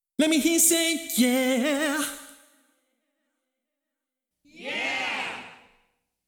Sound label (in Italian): LOOP VOCALE